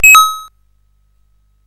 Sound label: sid CSG8580R5; Gremlins 2-The New Batch